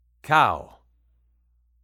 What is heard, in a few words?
cow; 5etools; bestiary